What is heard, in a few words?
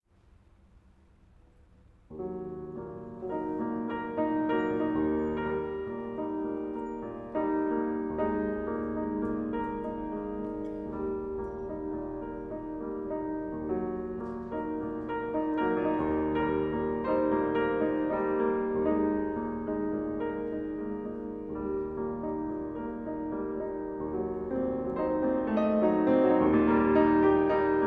Sonata for Cello and Piano in G Minor Andante live; Rachmaninoff; Op19 III